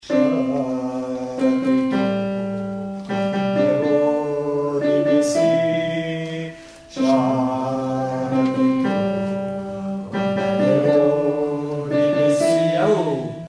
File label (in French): chichi HOMMES refrain; chants